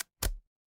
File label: ui click